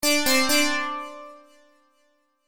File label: Bleep Bloop Bleep